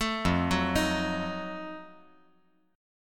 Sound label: F 7th Flat 9th F down